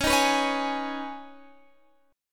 C sharp 7th Suspended 2nd C sharp Guitar Half Step Down